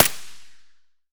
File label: ak74 silencer distant, weapons; S.T.A.L.K.E.R.; IWP